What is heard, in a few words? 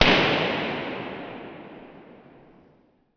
impulse cathedral